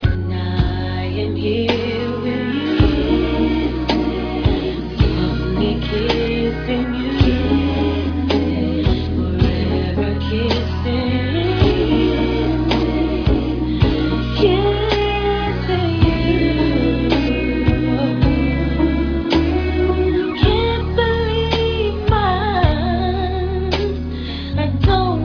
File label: kissing